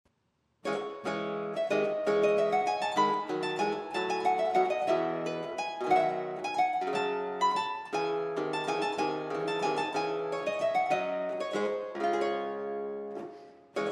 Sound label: corrente, harpsichord; salvatore